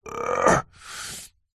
human male vocalisation pain strained ahh with inhale; zapsplat